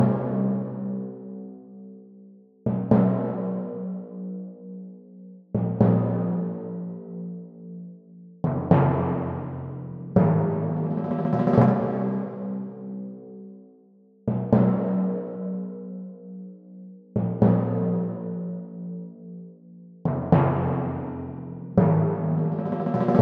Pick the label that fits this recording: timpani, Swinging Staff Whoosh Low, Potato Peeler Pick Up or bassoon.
timpani